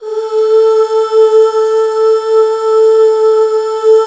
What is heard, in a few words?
UUHH, Back Uuh Fem